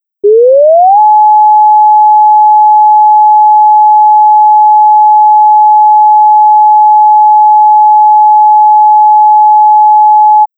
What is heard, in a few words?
Steady